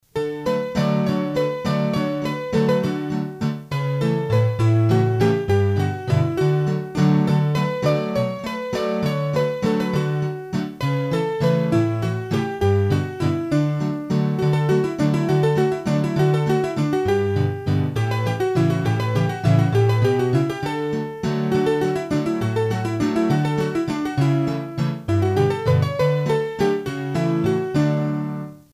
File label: hurdy-gurdy, Valses; Morgane